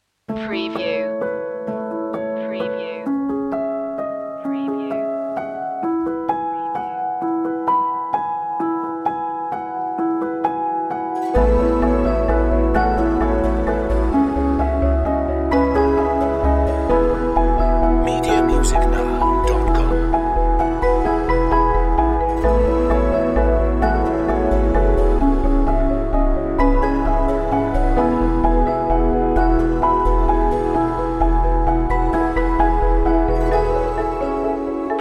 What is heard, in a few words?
in the clouds no drums